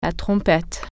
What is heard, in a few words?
trumpet